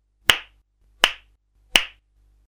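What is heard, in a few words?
slap sound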